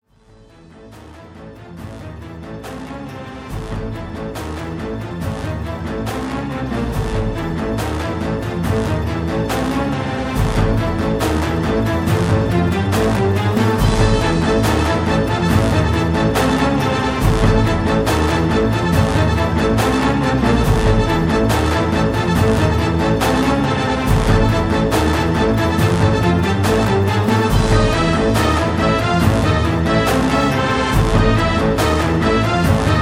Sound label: BATTLE Close Progressive Fight